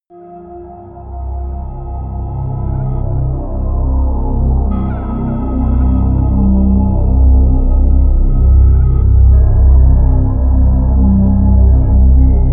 drone glitchy bell

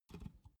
big can filled pick up hard

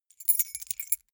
key ring small rattle

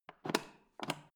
foot switch turn on off soft

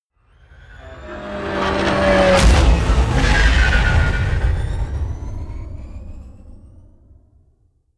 spaceship fly by

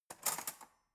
cutlery fork drawer handle

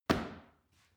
paw kick soft

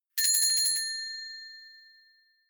notification bell

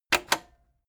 cassette eject click fast heavy

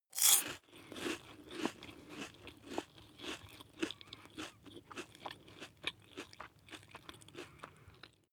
chew biscuit one piece

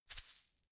shaker plastic egg hit double hard

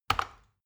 plastic button tiny click heavy fast

